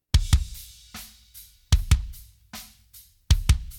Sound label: drums